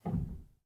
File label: rel, Grand Piano; bitKlavier Grand; Piano Bar